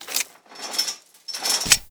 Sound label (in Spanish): ABRIR ENGANCHE BARRA